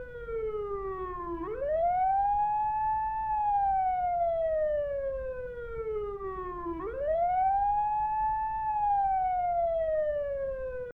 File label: Vehicle Police Siren Loop; Road Redemption